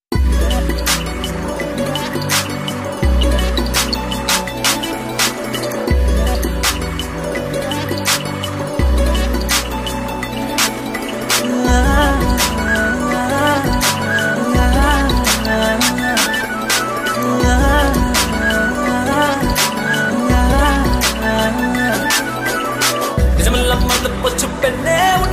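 tamil love ringtone love background music ringtone; caron tunes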